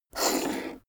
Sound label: poker chip flick slide table long; chips